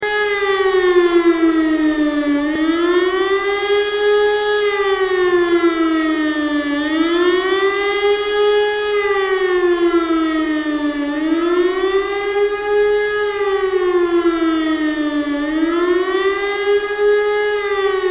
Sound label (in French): Sirene Alarme